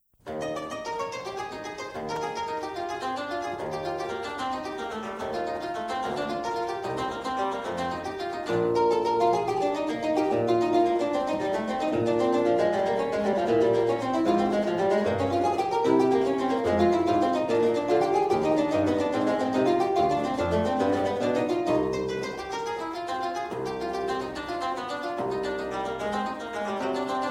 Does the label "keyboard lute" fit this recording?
Yes